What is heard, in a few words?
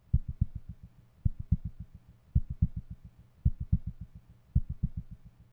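kodou, heartbeat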